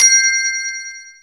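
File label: R8 percussion; X-Static Goldmine 1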